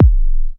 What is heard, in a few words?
verby kick drum single shot F sharp key, bass drum sample tuned to the F# note